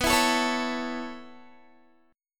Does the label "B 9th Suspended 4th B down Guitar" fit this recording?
Yes